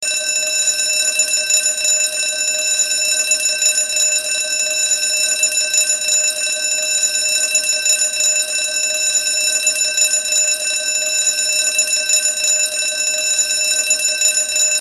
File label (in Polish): szkolny dzwonek